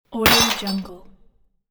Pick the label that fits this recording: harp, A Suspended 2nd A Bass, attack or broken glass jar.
broken glass jar